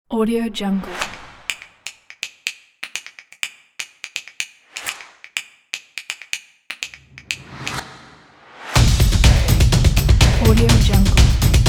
this is percussion

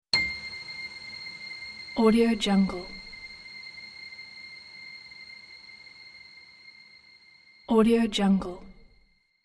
piano harsh high note struck with reverb

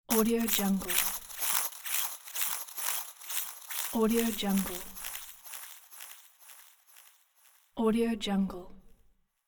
metal crackling

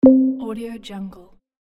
modern button press

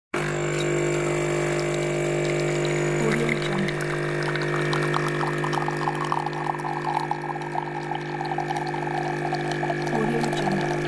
coffee making

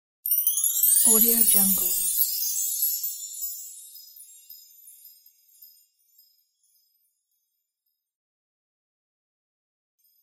wind chimes

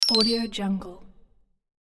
thin bell ringtone

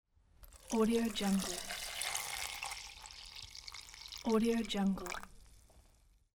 pouring milk into glass

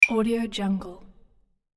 notification sound

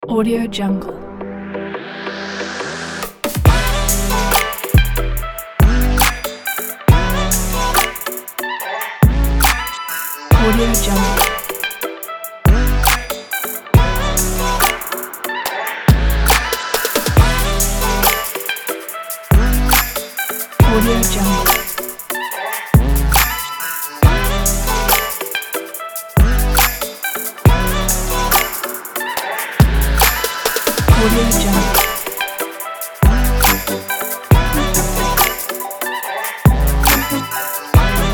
promo and vlog vibe

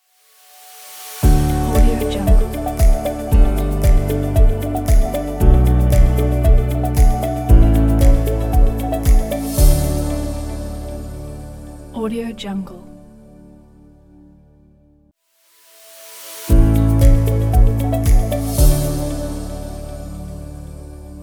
soft tech logo intro

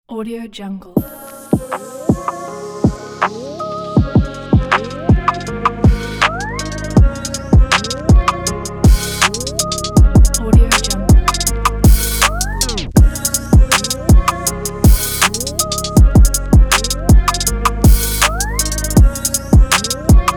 upbeat urban atmospheric trap beat

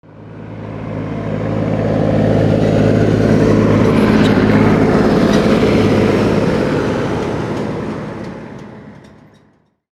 tractor with trailer passing by

